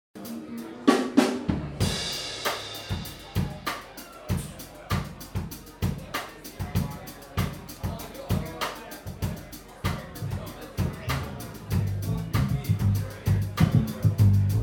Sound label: Atmosphere